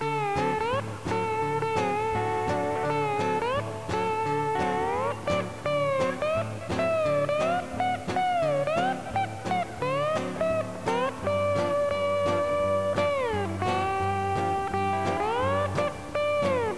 music; krustykrab

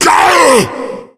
nemesis pain; Reega kz

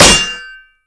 knife hit wall

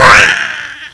light gut flinch, zombie; Zombie Darkness

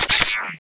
long gun change

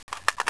inserting, weapons m4a1; mw2

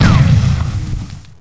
flashbang

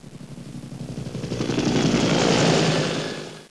helico; de dockland

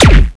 long gun

